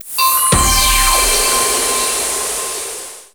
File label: RESULT A; maimai